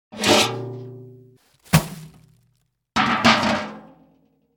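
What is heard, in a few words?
Threw out trash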